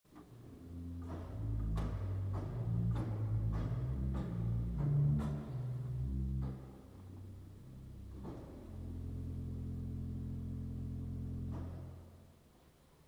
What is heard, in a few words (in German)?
P Subbass 16